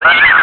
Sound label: Shock Burn; Link getting shocked (#2 also used for getting burned)